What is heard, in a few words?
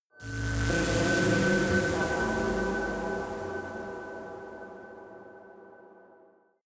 space unknown alienation call tension; energie